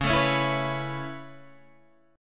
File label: Db Minor 7th Sharp 5th Db down, chord; strummed